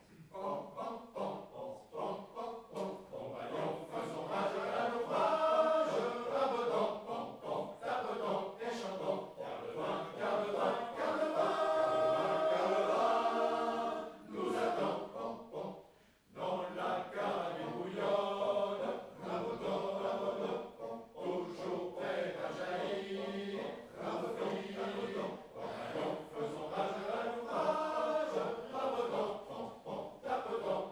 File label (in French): Chant des tonneliers; Chœur du Léman; Coppet